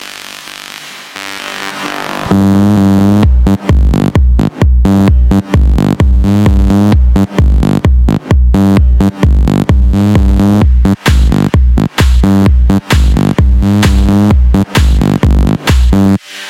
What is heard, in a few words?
Hard Bass